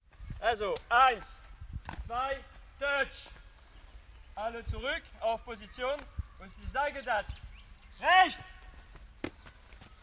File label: Touch Rugby Training